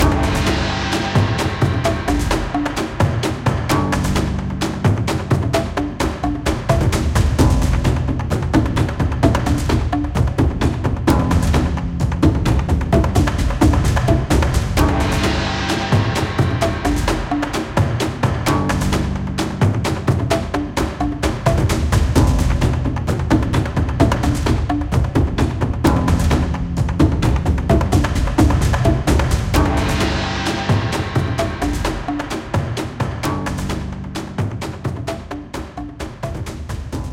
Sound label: Lava Luau (Speed Up); Cooking Dash